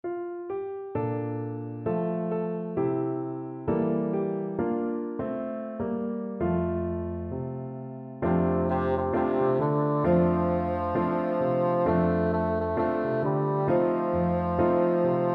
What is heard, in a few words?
this little light, bassoon